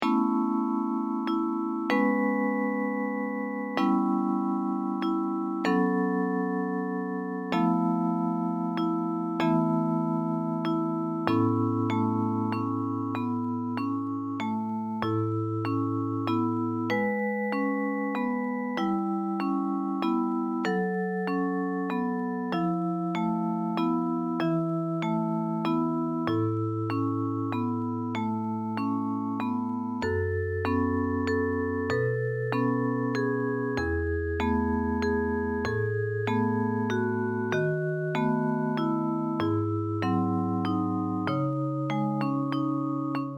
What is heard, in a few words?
short melodies christmas melody